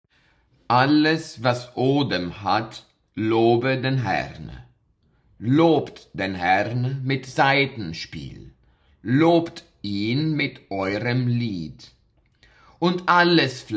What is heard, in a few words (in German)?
Chor und Soprano Solo